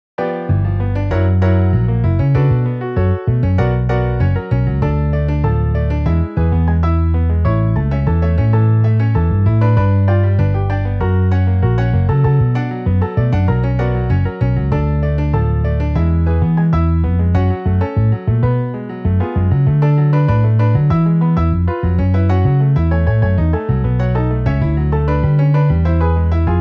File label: Piano Bass MIDI